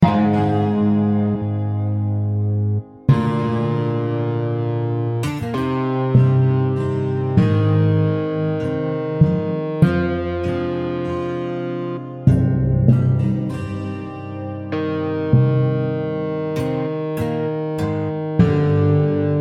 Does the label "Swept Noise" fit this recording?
No